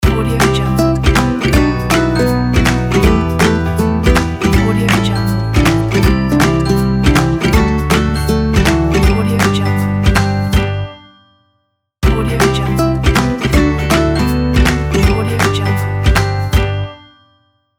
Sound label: ukulele logo